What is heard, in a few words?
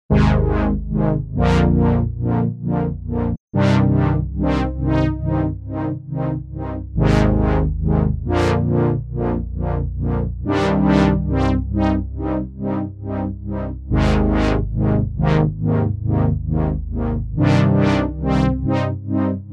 power lfo; obx; vst audio; opxvst